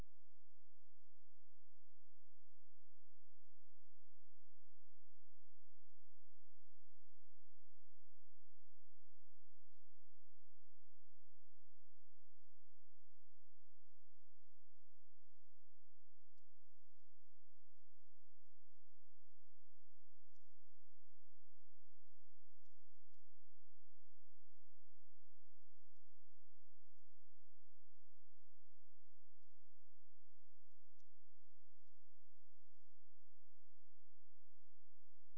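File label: hydrophone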